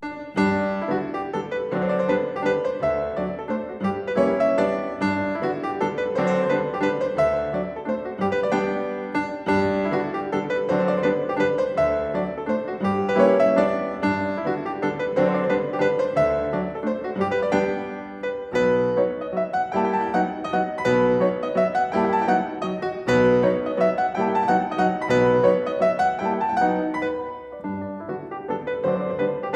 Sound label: Mazurka In G Major, Piano; Chopin